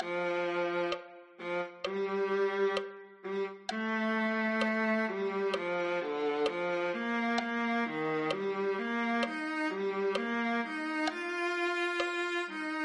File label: viola